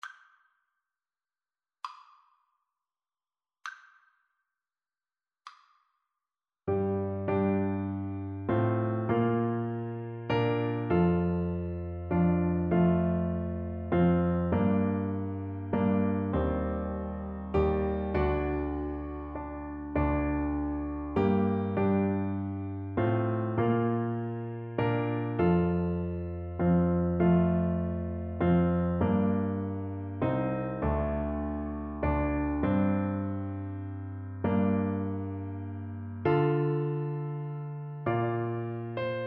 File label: horn, French Horn